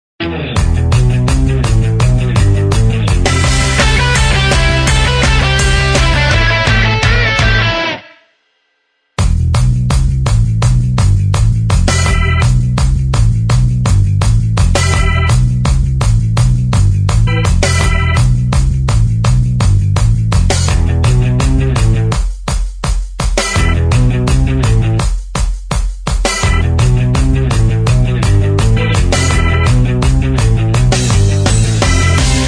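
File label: tv theme instrumental, backing track; Nickelodeon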